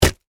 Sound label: Impact Punch Hard, Wet; Punches and Hits; Combat; BlastWave